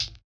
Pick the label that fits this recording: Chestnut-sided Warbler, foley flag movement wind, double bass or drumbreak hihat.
drumbreak hihat